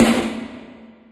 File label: Snares, Real